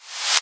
Reverse FX

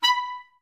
STAC B4, Orchestra; Best Service; Peter Siedlaczek's Advanced Orchestra

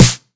909 Snr Sweet, Tape 909, SD; Goldbaby Tape808 & Tape909; The Tape 909

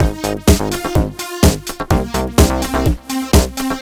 126 bpm full, combi loops, nu dance electro; Zero-G - Soundclash; Frankfurt Zwei

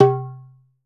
SKINNED UDU

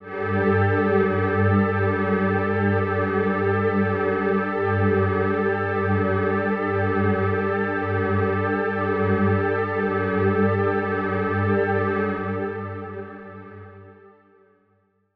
One Shot Drone G, dystopian drone; One-Shot Drones

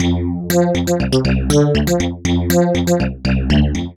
WET BASS; East West; Twisted Textures-BT

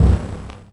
Perc Hit, drums; Drums & Drones

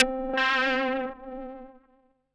SYN, Drum & Bass, 160 BPM; Ueberschall; Drum & Bass Flash Trackz

